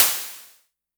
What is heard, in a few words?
Crashes; LIMAT Div Dept; Drums